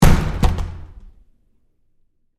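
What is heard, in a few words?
Door Bathroom Stall Close Close Up Hard; Household; BigRoomSound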